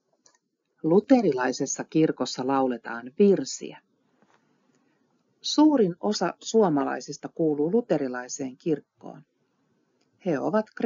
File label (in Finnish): Luterilaisessa kirkkossa lauletaan virsiä